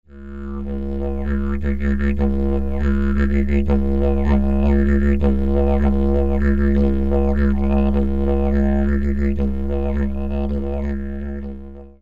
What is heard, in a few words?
Traveller Didge, Didgebox; Schlagwerk